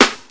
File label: clear snare sound b key; snare drum samples